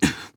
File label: Assassin Grunt Fast; Realm Royale; Anarchist